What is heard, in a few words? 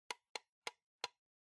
Clock Alarm (Dial)